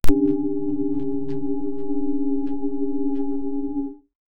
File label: faint wind diya crackle; instruments